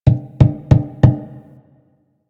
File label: Finger Thump; Warframe; Rail Jack Hunt